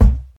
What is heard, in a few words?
Bassy Kick Drum Sample C Key, Royality free kickdrum sample tuned to the C note.